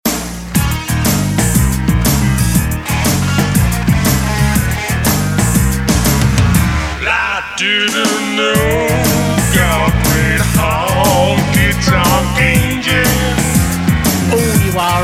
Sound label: honkytonk, Country Music